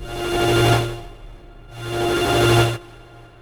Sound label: Arp 140bpm C, Trance